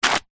clothing item wet drop to ground splat

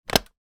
fan button press; household

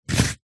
foley binoculars zoom dial single turn; zapsplat

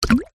cartoon drip large heavy

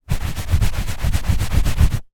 foley rub scrub rug

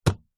office folder ring click open

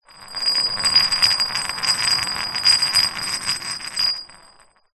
metal bar small roll tiled floor; foley

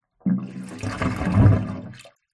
household bathroom water drain gurgle; zapsplat

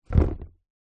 boots down on wood

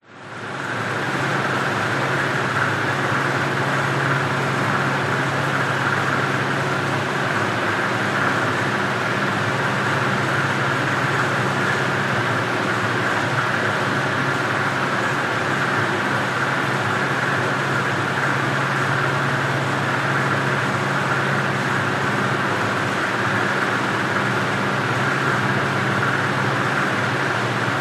household room tone bedroom fan in bg

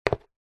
foley footstep single soccer boot on tiled floor

